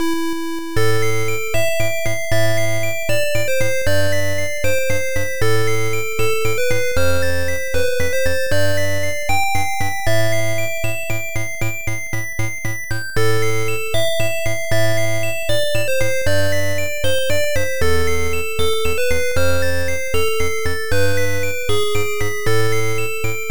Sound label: Funeral March (Game Over); Herzog; Sharp X1 Turbo; psg